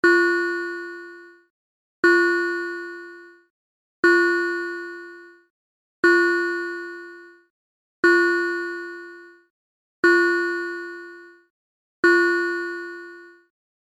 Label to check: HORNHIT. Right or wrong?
Wrong